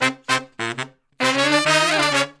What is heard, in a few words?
Horn Section; Traditional; Sonic Foundry (Sony Creative Software); Jazz Solos and Sections